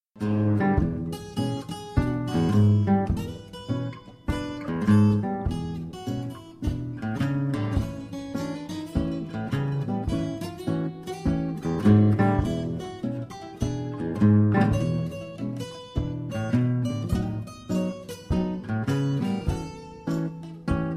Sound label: Trio blues